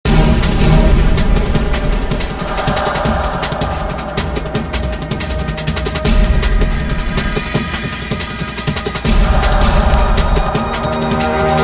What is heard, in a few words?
boss music